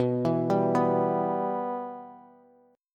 B Suspended 4th Sharp 5th, Bass; Bsus4#5 arpeggiated; 19,19,17,16; JGuitar

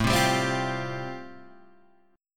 A-Minor 7th Sharp 5th, Guitar Standard, chord; Am7#5 strummed; JGuitar

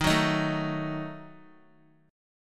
Eb Diminished, Guitar; strummed; x,6,6,8,9,x

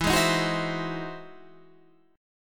E Augmented Major 9th, Guitar, Standard; strummed; E+M9; JGuitar

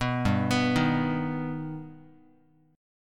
G Augmented, Guitar, chord; G+ arpeggiated; 2,3,2,1,x,x; JGuitar

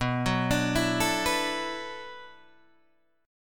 B-Minor 11th B down Guitar Open D

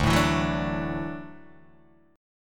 D-Major Add 9th D down Guitar Open D

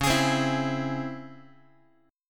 Db Minor Major 7th Db Guitar; DbmM7 strummed; JGuitar

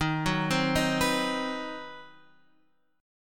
C Minor Major 7th Flat 5th Eb, chord

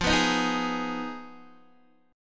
F Minor 7th Flat 5th, Guitar, DADGAD

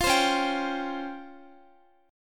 Db Major Sharp 11th Db down Guitar; strummed

